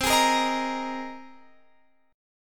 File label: C Minor 7th Sharp 5th C down Guitar Standard; strummed; capo 3